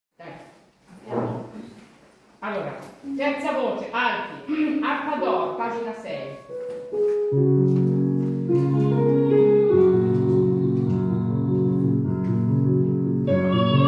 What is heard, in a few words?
va pensiero Alti